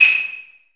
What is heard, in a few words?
LARGE ROOM 808, REVRB